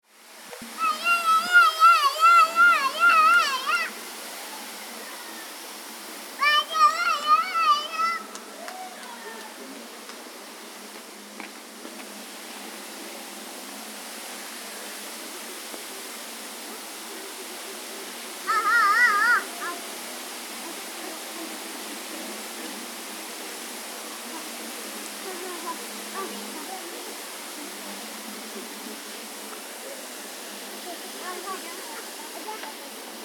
tiznit groupe femmes; resonances oasiennes

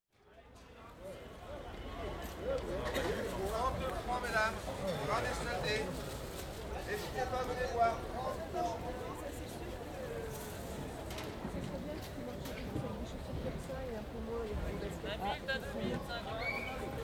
Glissements et eloignement; Metabole

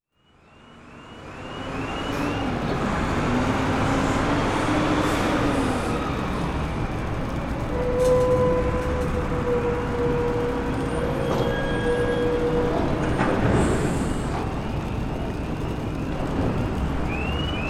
port anvers port il faut

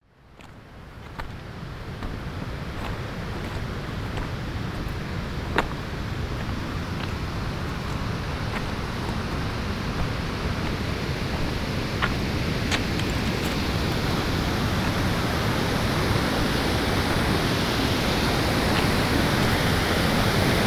franchissement

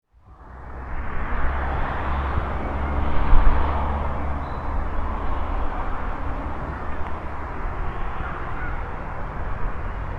nature bord route enfants masque

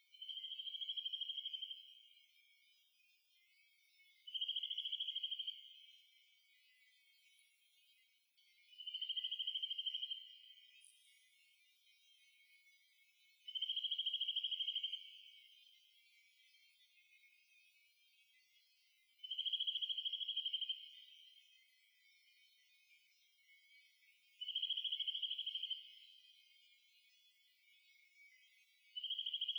FOUILLIS d OISEAUX

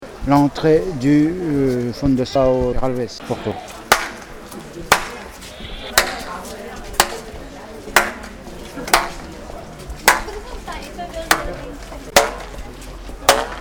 echo flottant; Porto SERRALVES

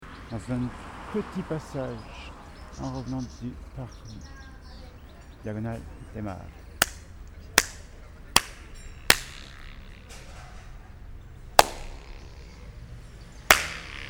echo flottant Barcelonne

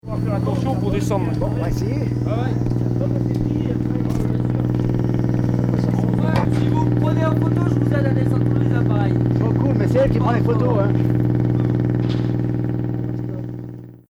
descente a bord; lesconil

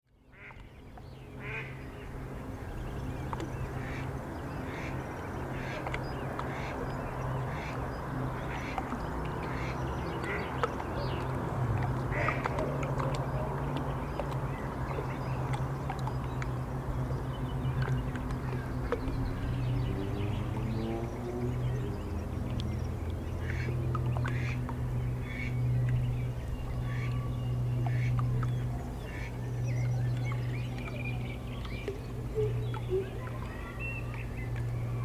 etang quietude; fallavier; cartophonie

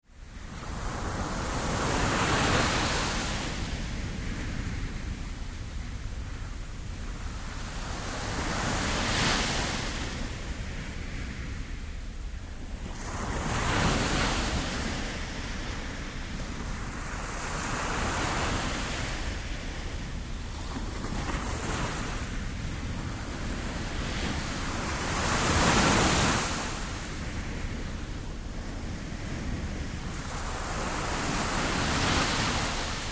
vague

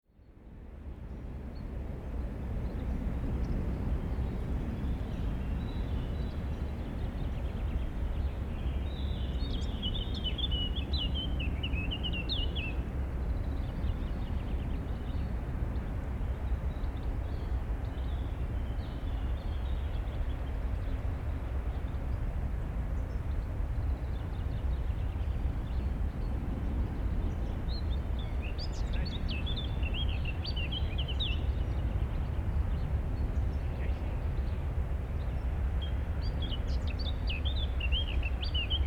digue canal; bourbre; cartophonie

